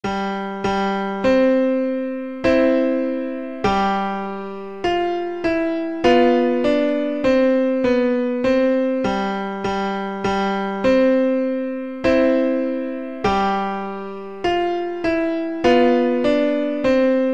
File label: chateau, piano